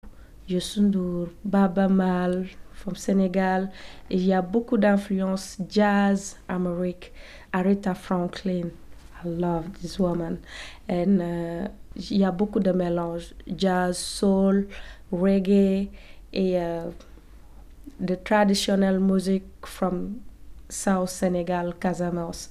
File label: Voice & Guitar